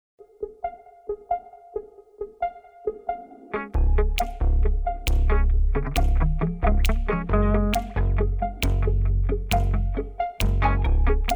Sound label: Electro Pop